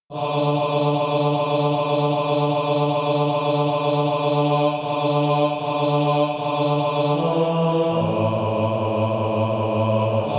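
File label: bass, Practise Music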